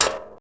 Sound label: ladder; PC Action 1999 February (Bonus); Dark; thief